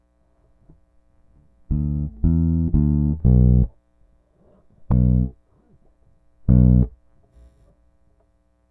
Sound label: bass